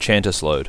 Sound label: Chanter Slowed